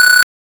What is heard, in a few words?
shoot, attack sound; カタナ１